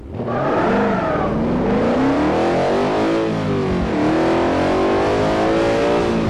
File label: first, vehicles; TDMCarpack; slsamg